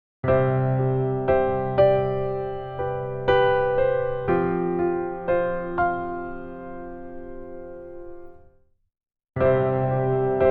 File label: Bright Piano Hall